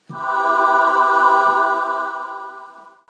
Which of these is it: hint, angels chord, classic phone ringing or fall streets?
angels chord